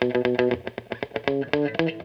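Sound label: guitar, right; USB Soundscan vol.32; AKAI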